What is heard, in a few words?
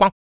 KUK, MALE PERC